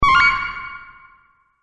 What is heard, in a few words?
Multimedia Correct, beep; Andrew Fleming